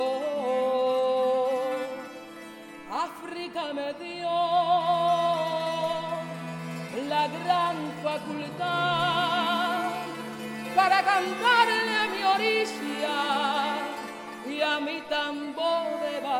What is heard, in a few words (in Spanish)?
TAMBO TRADICIONAL CUBANA; ELEGGUA QUIERE; orishas